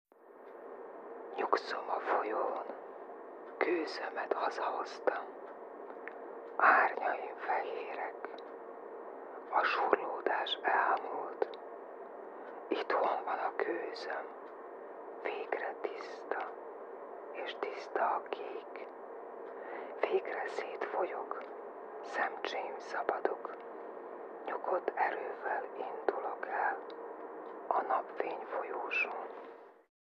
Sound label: best of disco